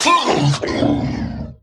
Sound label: Male Mech Death